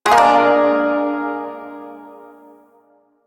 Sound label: Shawzin Min Chord L, Music; Chords